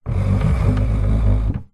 household coffee table wood maple heavy legs slide wood floor medium